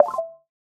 Button Reset All

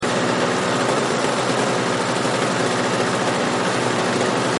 sound idea loop; balloons-and-static-electricity

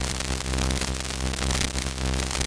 Electricity; john-travoltage

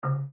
Edge Boundary Bottle; phet io wrapper sonification; gravity force lab basics

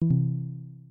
balloon Drop E; phet io wrapper sonification; balloons and static electricity

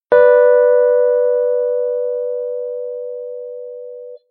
stable; build-an-atom

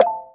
General Button; faradays-law

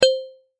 Precipitate Chimes; phet io wrapper sonification; molarity

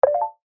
screen selection; phet io wrapper sonification

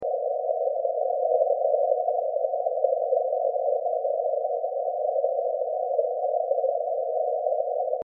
Concentration Loop Noise; phet io wrapper sonification; molarity

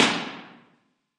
Cathedral Room

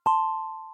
harp; phet-io-wrapper-sonification; build-an-atom

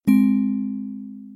bells; phet-io-wrapper-sonification; build-an-atom

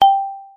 marimba G4

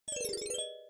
Reset Button speed; phet io wrapper sonification

